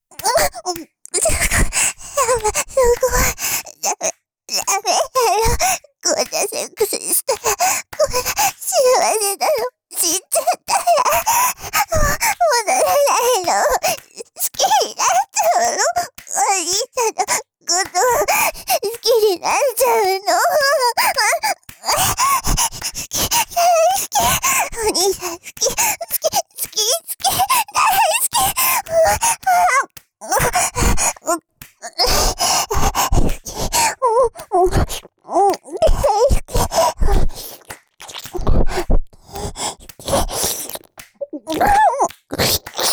激しく喘ぎながら好き好き囁き耳舐め！; 優しい年下JKに甘えた～い！

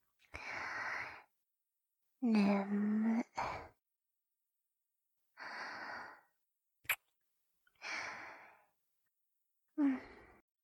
おやすみのキス, ASMR; 必然的セックス欲求

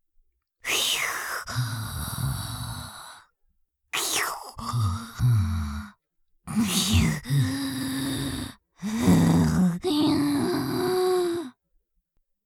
いびき; 失神中

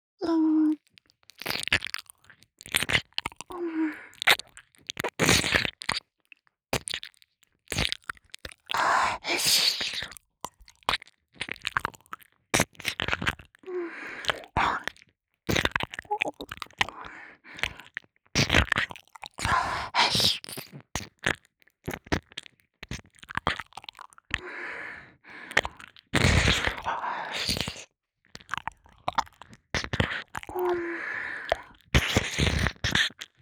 耳舐め (左耳ver.ループ対応), ASMR; メスガキママのたっぷり耳舐め♪

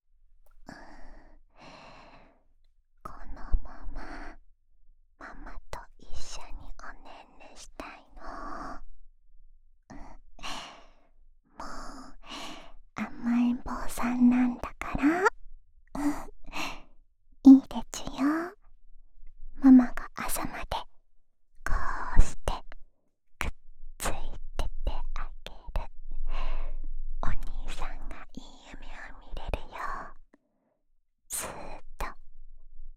安眠用添い寝ループ（右耳）, ASMR

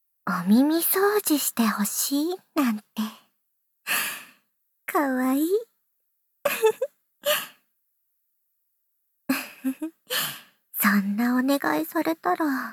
耳かき（バイノーラル編集 効果音あり大きめ）, ASMR

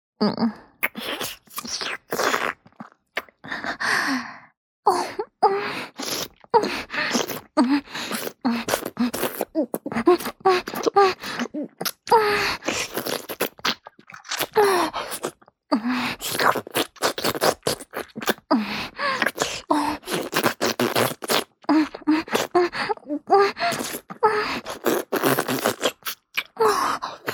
チュパ音, ASMR; フェラチオ(強)：頭を前後に激しく振って陰茎を深くしゃぶる

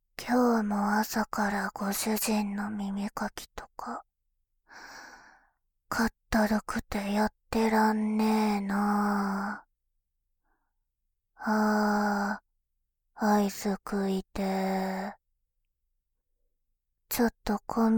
メイドさんの耳かき, ASMR

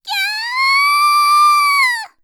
"きゃああああ！", 妹; ハイグレボイス集

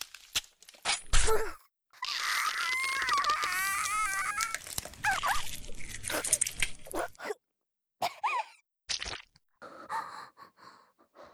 お腹を刺す; 効果音あり

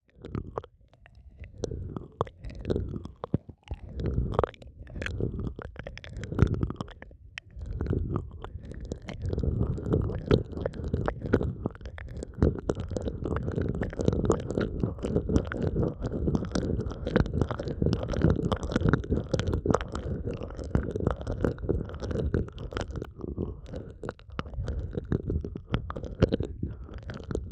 猫族のねーっとり耳舐め, ASMR

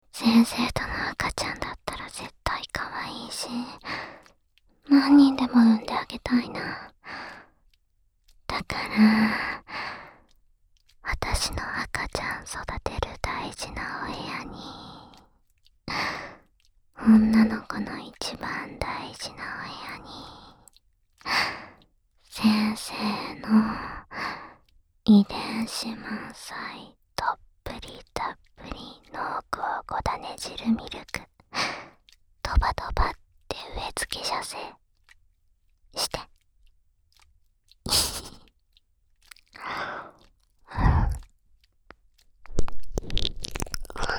遺伝子 おねだり 耳舐め♪; 小悪魔おしえごニートJKと子作りえっち♪

